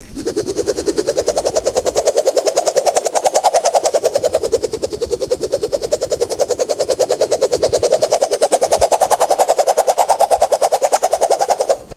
continuous spinning swish of a buggy whip; weapons

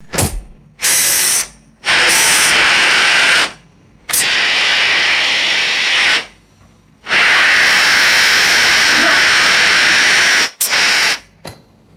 pressurized air bursts from fire extinguisher

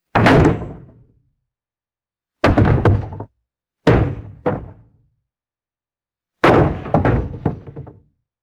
heavy resonant wooden impacts

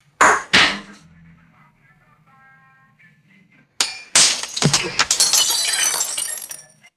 metallic clanks and massive glass shatter

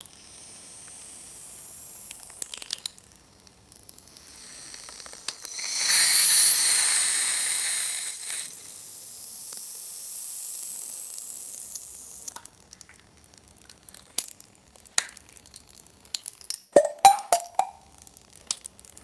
liquid nitrogen hissing and crackling

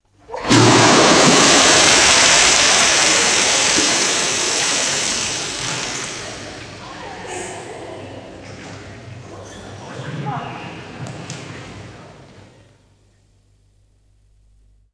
indoor swimming pool splashes and echoing voices; water

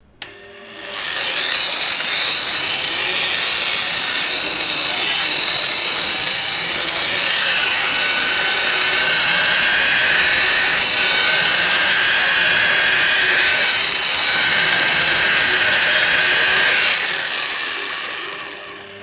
electric grinder revving and freewheeling; tools

